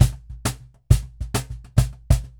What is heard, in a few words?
Cajon Loop 100BPM; percussion; musicradar